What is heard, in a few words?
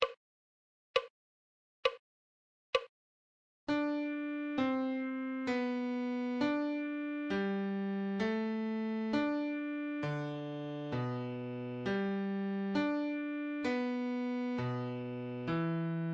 musical reading in bass clef Adagio